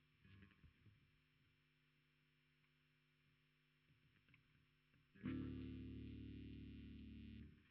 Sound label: Bass Sansamp